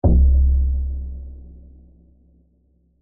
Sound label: sound design cinematic thud hit lite reverb dark tense